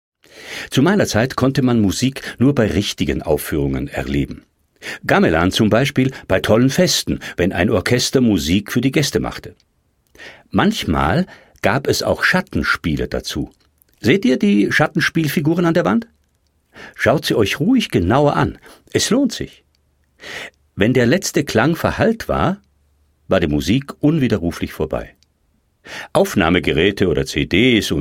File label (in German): Gamelan Orchester